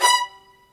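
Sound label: MARC VLN F#4, violin; AKAI S-Series CD-ROM Sound Library VOL-2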